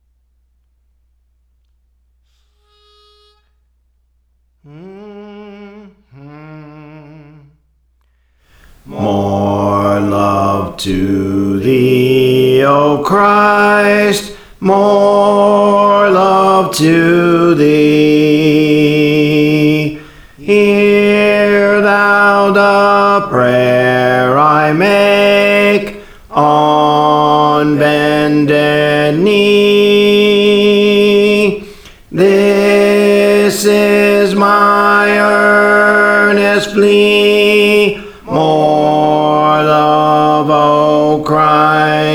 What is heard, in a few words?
Bass, Songs; Praise For The Lord Training; More Love to Thee O Christ